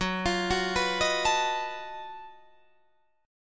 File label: F sharp Minor Major 13th, Guitar DADGAD